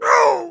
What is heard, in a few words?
Spy pain severe